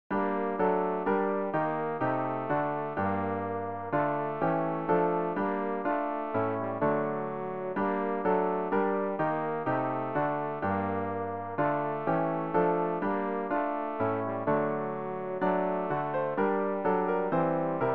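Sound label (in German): tenor, Übehilfen; Ref. Gesangbuch; RG 165: Liebster Heiland, wir sind hier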